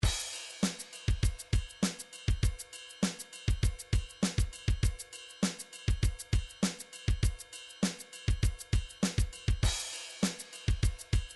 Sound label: drums pop rock, midi drum patterns